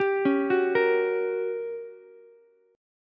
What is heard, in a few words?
G Minor Major 7th Flat 5th, G, down, Ukulele; arpeggiated; JGuitar